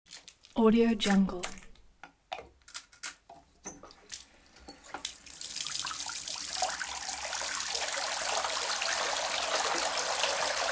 washing

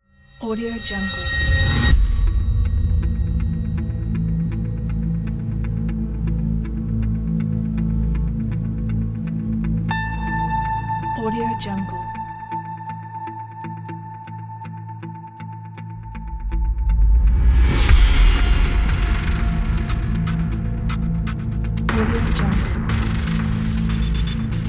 cinematic trailer

